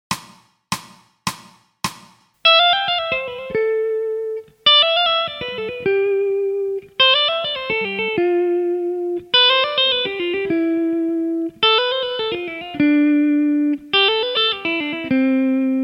Technical Study, electric guitar; Technical Study 2

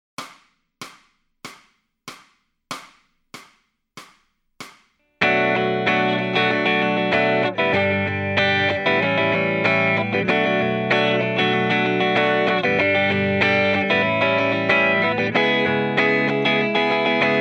Lead Backing Track, electric guitar

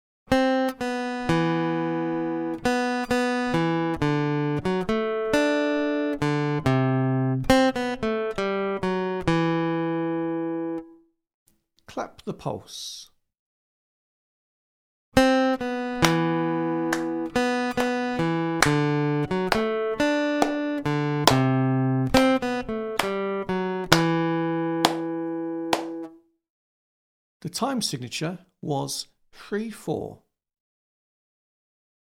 Aural Rhythm Test, acoustic guitar; Grade 8

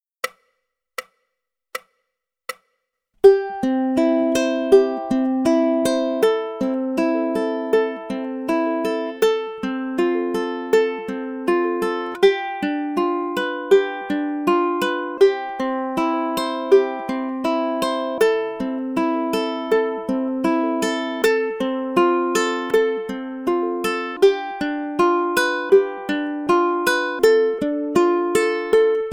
Fingerstyle Study, ukulele; grade1

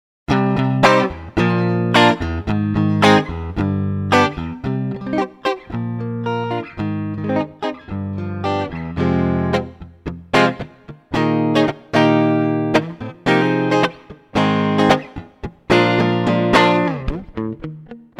Improvised Rhythm Guitar Playing Example; electric guitar; electric guitar performance diploma

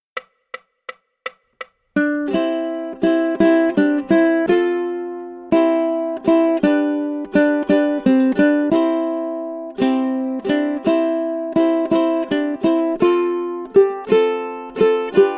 For Hes a Jolly Good fellow, ukulele

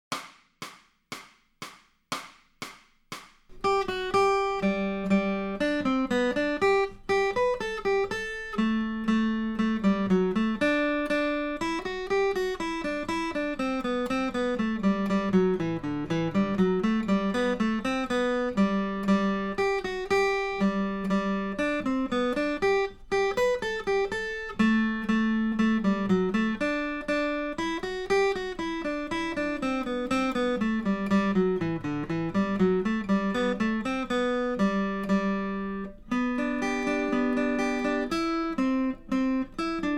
Sailors Hornpipe, acoustic guitar; Grade 4

